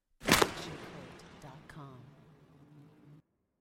designer breaker switches; SoundsCrate

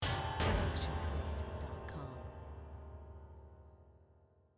Piano clank; SOUNDSCRATE

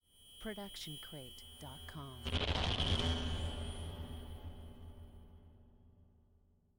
haunted static; soundscrate

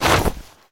duffle bag drop; SoundsCrate

